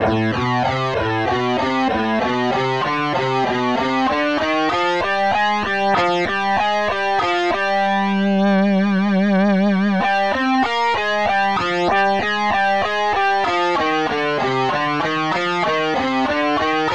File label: Guitar - 96 bpm